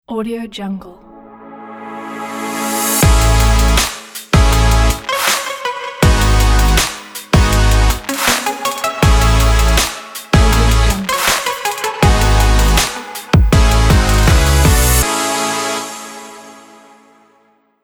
happy excited logo

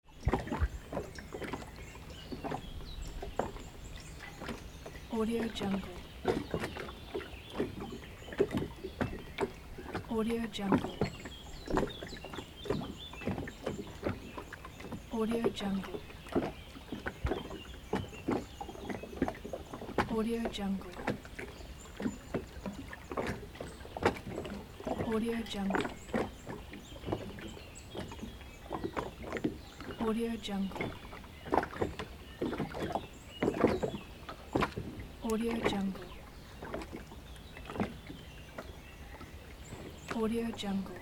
lake harbor ambience

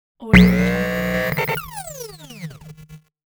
hydraulic sci fi

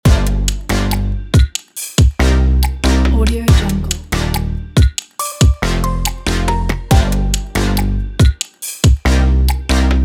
fashion electronic future bass

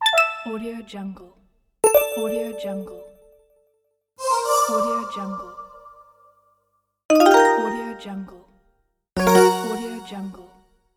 ui app menu sound effect

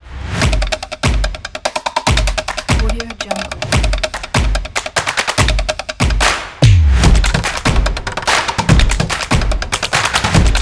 fast chase percussion logo

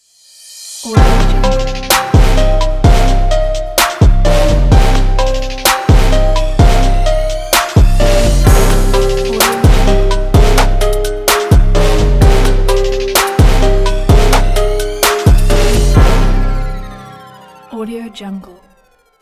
the rap logo

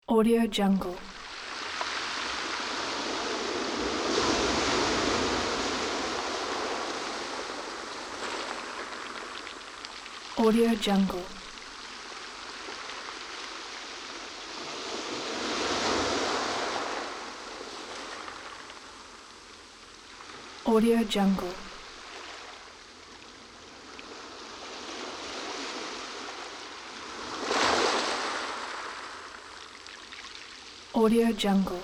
heavy ocean waves

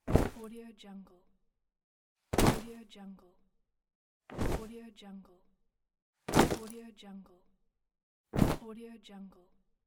cloth drop

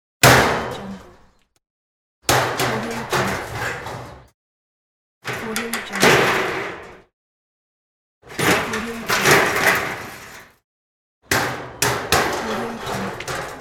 trash can

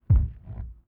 robot footsteps; prosoundfx

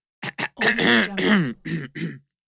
male clearing throat

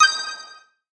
game touch

